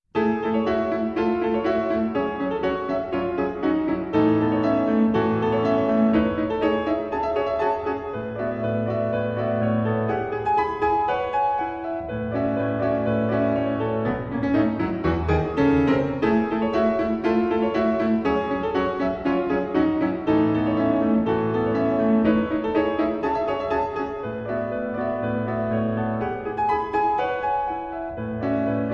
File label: Prelude for Piano